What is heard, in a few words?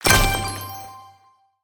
extra win